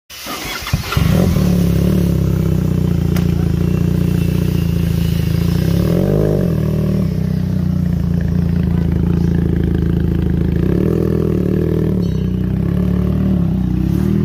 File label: Benelli exhaust